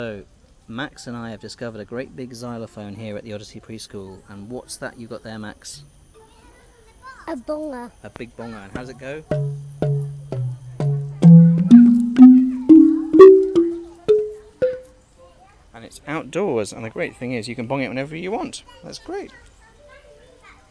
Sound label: outdoor xylophone